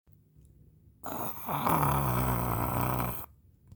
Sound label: cute cat purring